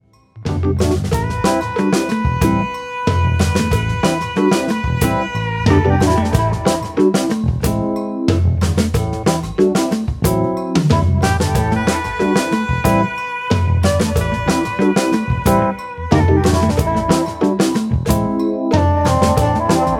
Funky March

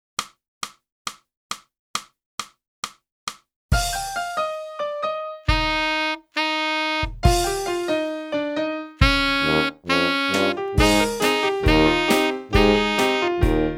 sax

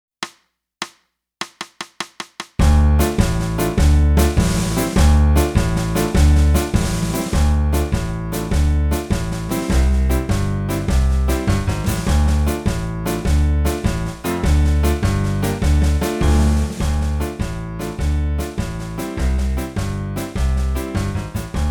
flute

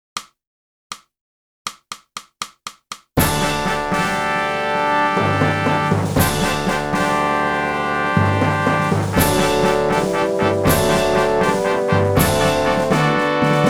sax

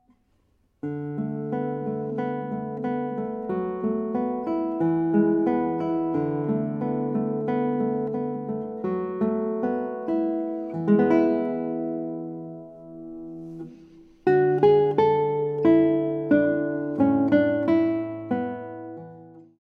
Guitar

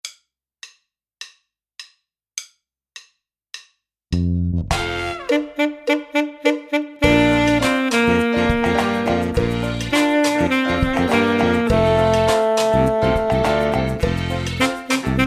Student Sax

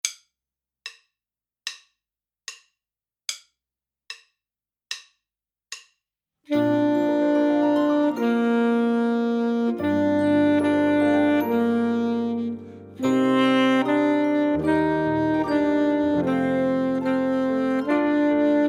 Chanson De Nuit Full Sax

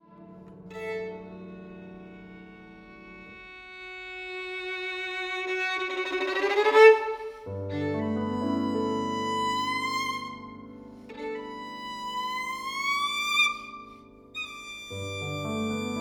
VIOLIN

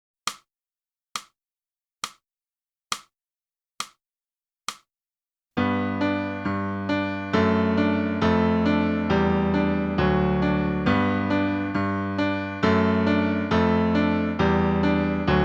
flute, bittersweet

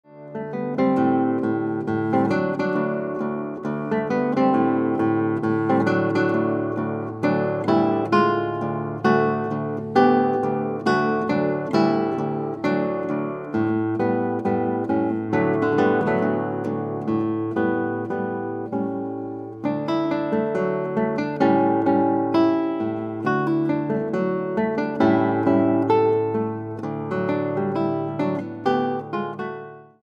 Guitar

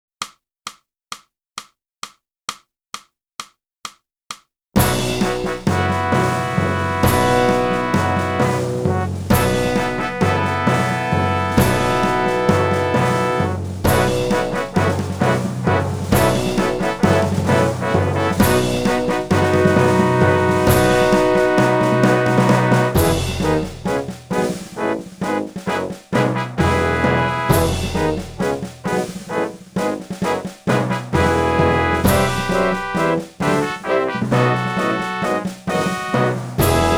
flute; Boosey Woodwind Method: Flute (Book 1)

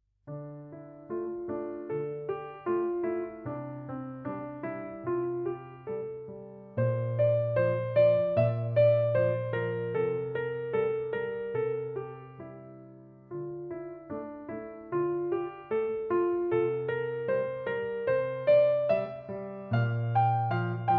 piano

